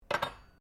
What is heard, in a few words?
cutlery general place bench, Household cutlery